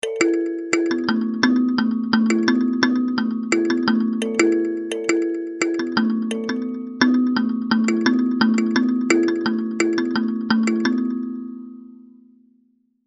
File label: Synclavier; Pikmin2